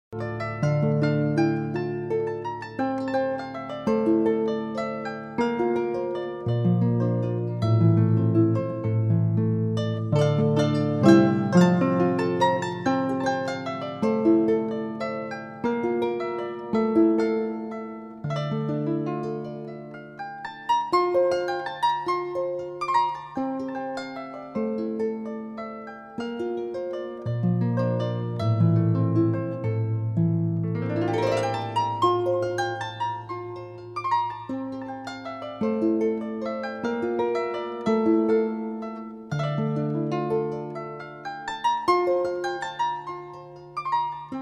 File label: Prelude, Harp; Greensleeves